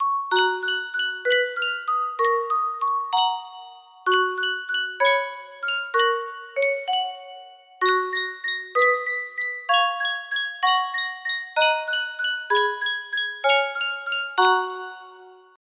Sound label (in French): sankyo boite a musique